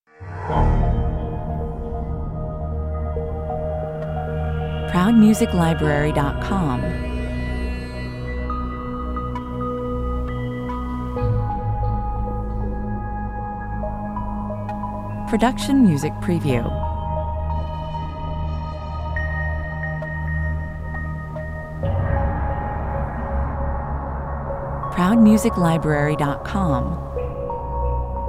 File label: sirene call (no vocal)